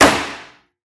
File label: Gun Shot; soundscrate